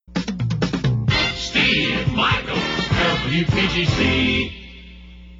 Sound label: jingles; positron; steve michaels